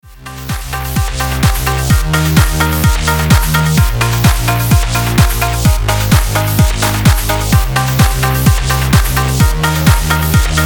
Electro Drums Dry; Mattertone

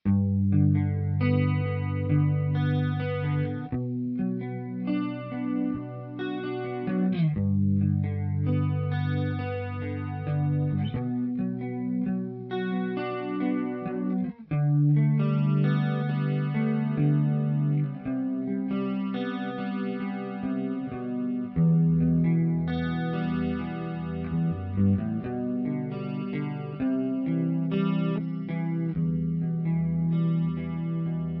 GTR C2, C2 Chorus, On electric guitar; C2 Chorus – Almost a standard. Ethereal. Great on clean sounds.

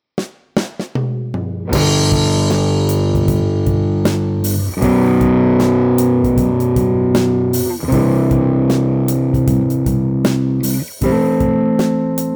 Rhythm Guitar; Tweed Deluxe

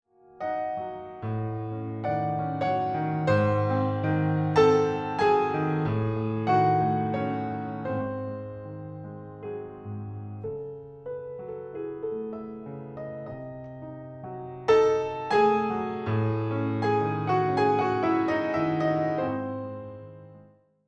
Piano Room; Verbtone

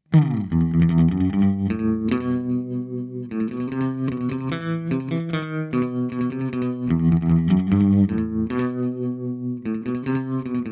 Surf-Rock TwinR Tremolo Delay, guitar; Surf-Rock – TwinR – Tremolo (TwinR) – Carbon Delay; ReTube Custom Shop

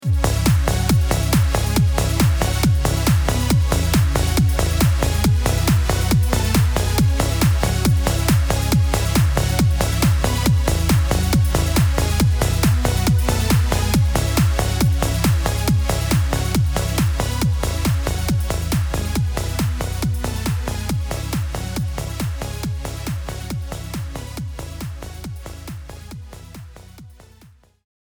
Trance Lead WET

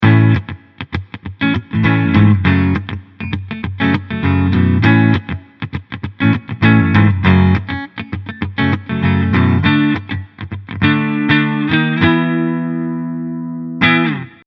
Clean Guitar DRY; Strip V3

